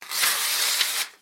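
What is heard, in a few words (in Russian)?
звук рвущегося листа бумаги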